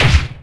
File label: boat hit; Splashdown; XBOX; Impact